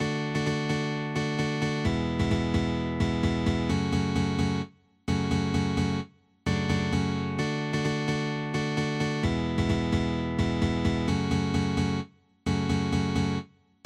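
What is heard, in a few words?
Keyboard Version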